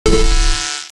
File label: game sound of death, glitch